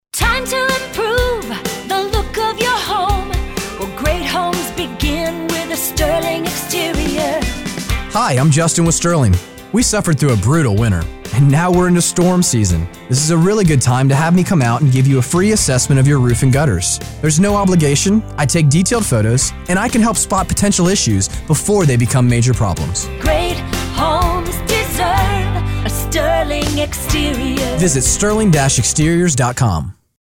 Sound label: roofing jingle